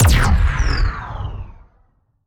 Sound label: Shot Large Blaster Warped Zapper; Robobeat